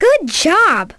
correct; lego my style kindergarden

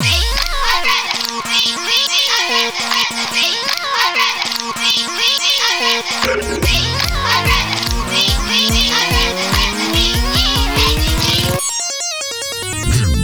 rise; Intake

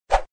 Sfx Enemy Skeleton Attack

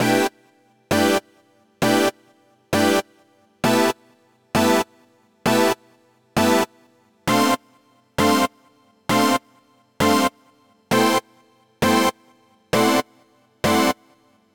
Poly Stacks; super crush k.o.

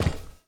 robot step; teardown; ps5 windows xbox series xs

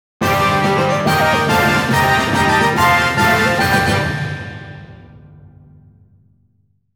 Victory Jingle; Busou Shinki Battle Masters; F BATTLE

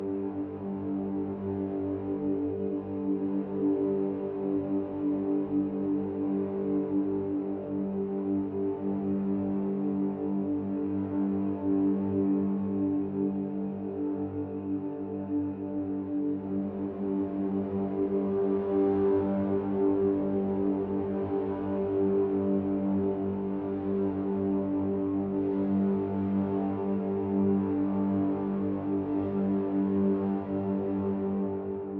Noiz; raji an ancient epic

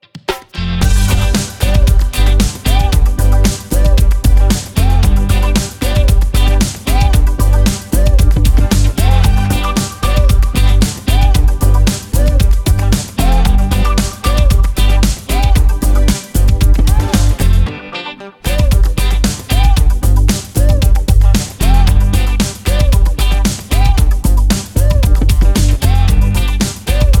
114 BPM Reggae; Yum Yum Cookstar

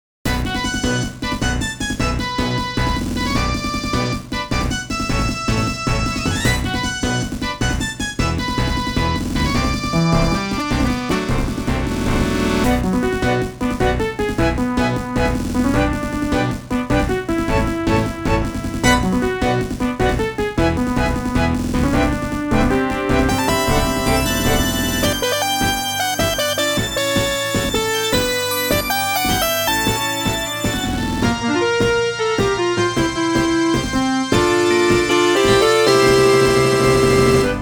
Menu; Video Games SEGA Mega Collection 2; Super Smash Bros. Melee

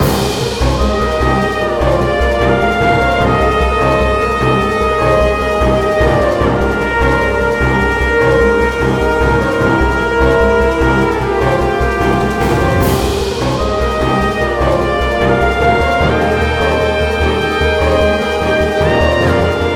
Loop; Lost Epic

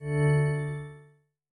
Rune Activate; Munin

